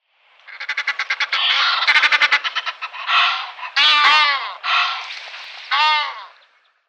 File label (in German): Nilgans Alopochen aegyptiacus, Vogelstimmen